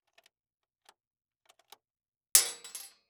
Weapon Bullet Shell 270 Rifle Eject Shells On Metal Surface; AdobeCC